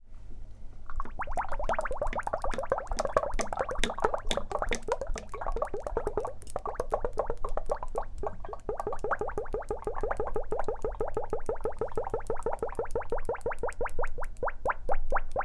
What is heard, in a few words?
Pouring Oil